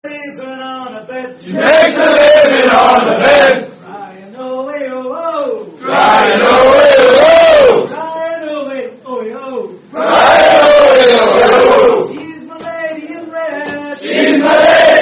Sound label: British Pub Sing-Along; Lady In Green (Variant Verses)